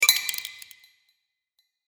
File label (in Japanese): 凍る